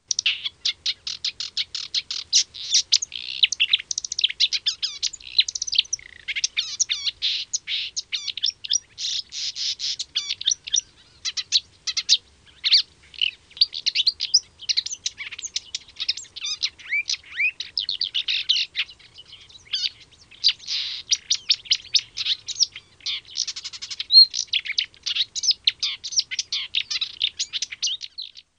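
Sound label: Marsh warbler; Madarak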